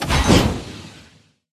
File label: Tank Scorching Loop start